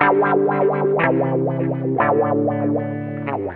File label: GUITAR